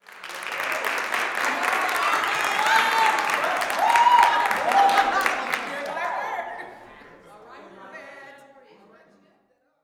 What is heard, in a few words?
SMALL APPL; Spectrasonics; Vocal Planet Vol.1 Gospel; akai